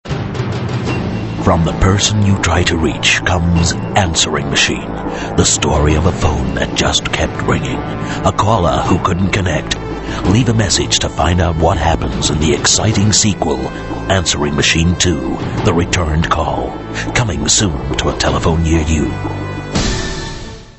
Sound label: Movie Trailer